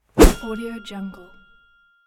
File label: ping